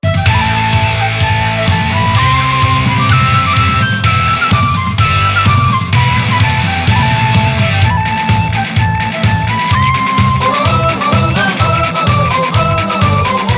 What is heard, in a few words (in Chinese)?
中國笛